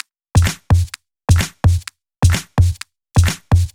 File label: Loop, 128 BPM